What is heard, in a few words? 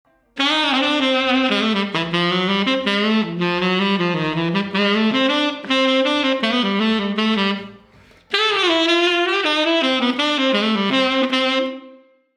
Tenor Saxophone sample